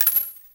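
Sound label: kar98 shell case fall; WAR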